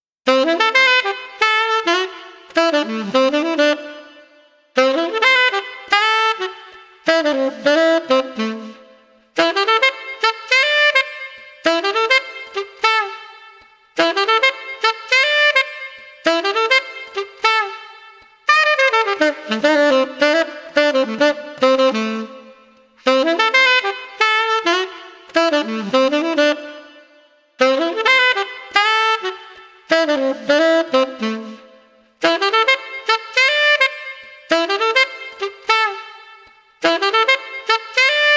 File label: Street Sax Exuberant 120